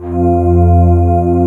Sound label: Orch Vox F1; Digital Sound Factory Xtreme Lead